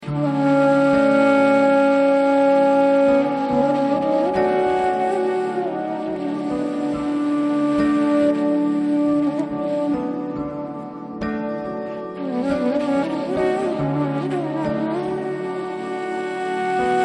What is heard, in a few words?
Flute